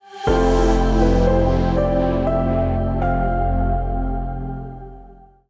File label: logo song